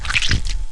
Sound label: squish